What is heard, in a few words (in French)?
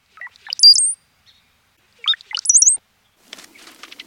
chant